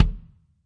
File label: real kick; A Real Freakin Drum Kit